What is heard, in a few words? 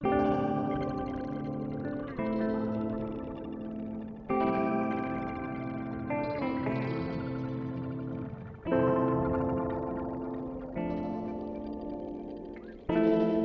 EHX 1440 Stereo Looper